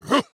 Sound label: Attack Small